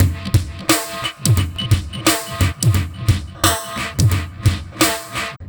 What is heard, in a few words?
Beats, Synchro Funk; Awash; Sonic Foundry (Sony Creative Software)